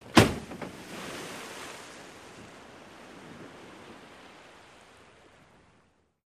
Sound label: Vehicle Dropped Wat